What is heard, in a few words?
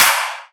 Big Clap; Claps; Future Bass; Drums; One Shots